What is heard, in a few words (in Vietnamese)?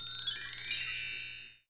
Tieng lam phep